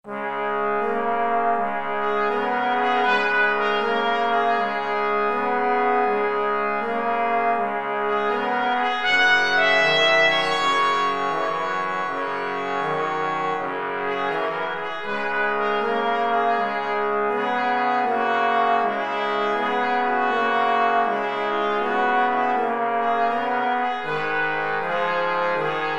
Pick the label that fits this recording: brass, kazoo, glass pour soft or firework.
brass